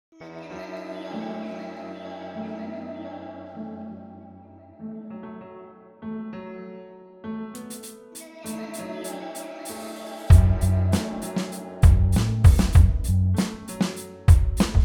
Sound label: BEAT